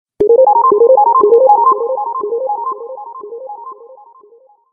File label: business beep